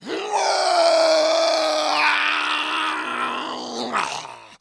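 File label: Monster Creature Growl, foley monster vocals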